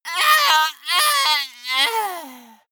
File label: monster die high